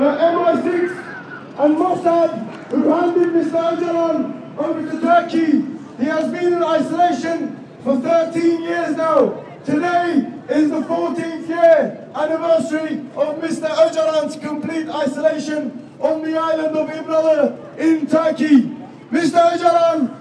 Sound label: march in london for freedom of kurdish leader abdullah ocalan